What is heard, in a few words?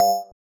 BELL